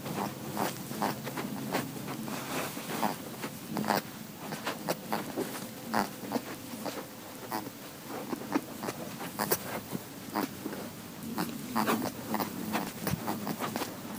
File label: plopp